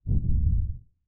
monster creatures large single footstep lfe horror